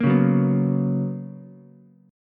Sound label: B Major 7th Suspended 2nd Ukulele, chord; BM7sus2; strummed; JGuitar